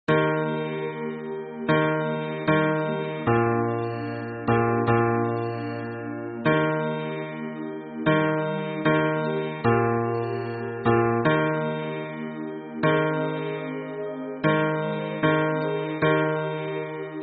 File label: hymn bass